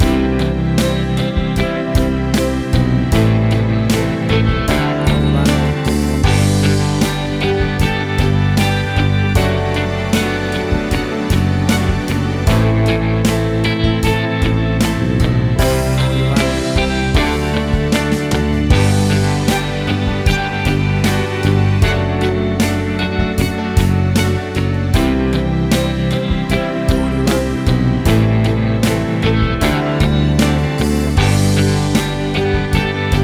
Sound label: Anthemic Pop Rock